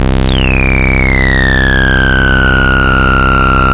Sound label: annoying saw filter synth